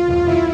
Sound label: music